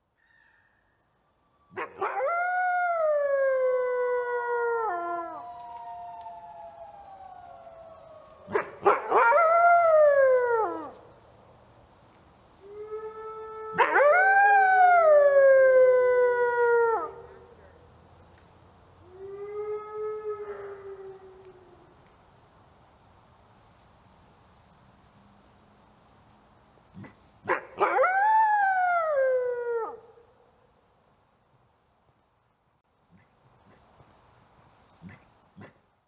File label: Red Wolfs Twilight Howl